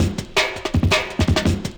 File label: rim